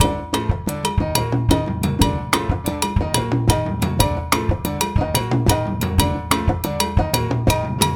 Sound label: afro cuban 6 8